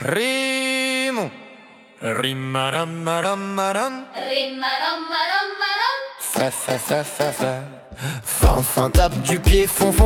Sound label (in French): fanfan fait des bonds, comptine rythmique